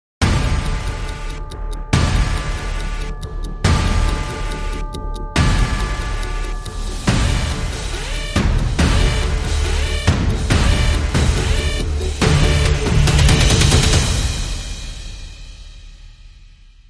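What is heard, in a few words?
Dramatic Sting, No Orchestra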